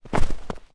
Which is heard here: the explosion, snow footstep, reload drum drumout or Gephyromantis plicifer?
snow footstep